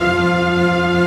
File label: orchestra C3, orchestra hit; Greytsounds VOL-1